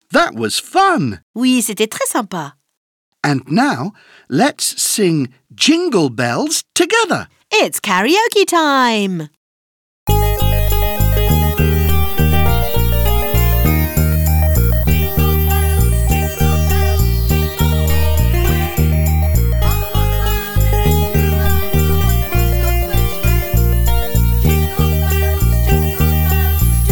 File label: Lets sing Jingle Bells karaoké; I Love English News